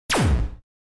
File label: hyper laser fire; Memes